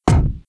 Feet; Playable Tutorial Level

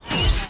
Menu Rotate; Playable Tutorial Level

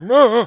Grunt; TR3; Playable Tutorial Level

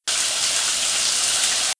Shower Loop; Playable Tutorial Level

